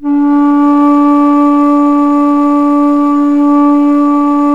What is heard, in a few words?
BASS D2, BASSFLUTE; Historical Instruments; AKAI; USB Soundscan vol.23